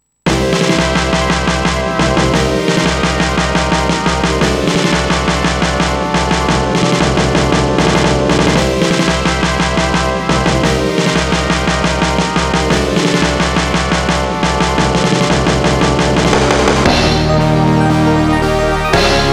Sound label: ym2151; gradius ii